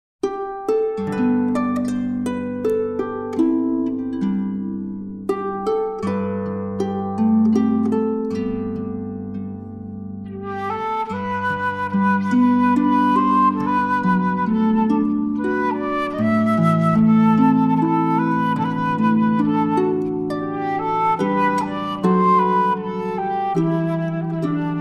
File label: Flute and Harp; Scottish Music